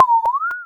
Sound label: Cry